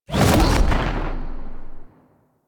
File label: Edge Lift; Warframe; Pacifist